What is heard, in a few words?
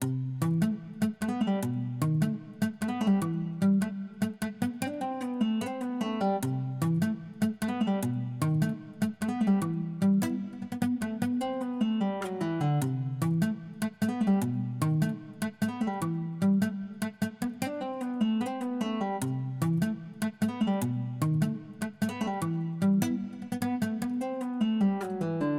Latin Trap Guitars Loop 75BPM Amin; santa